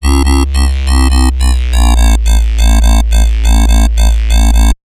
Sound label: Bassline, Dubstep and Trap